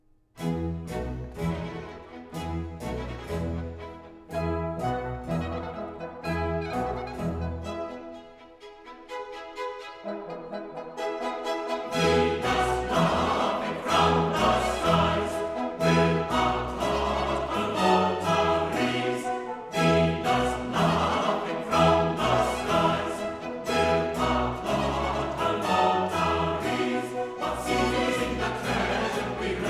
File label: Chorus Venus laughing from the skies; Handel; Theodora, HWV 68